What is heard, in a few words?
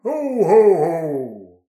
ho ho ho; soundscrate